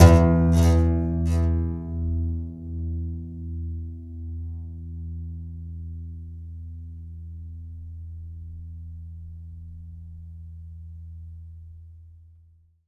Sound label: C. Bass Balalaika, Plucked Strings